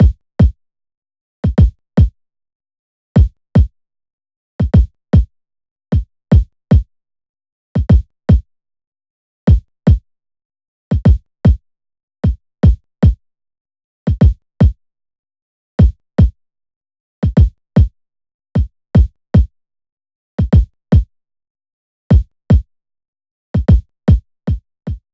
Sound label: Kicks 152bpm; AV Loft